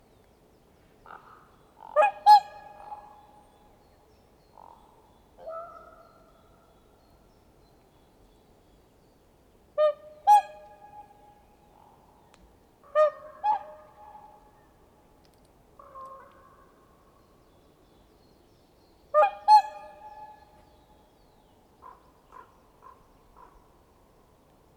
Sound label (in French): Deux Cygnes et un grand corbeau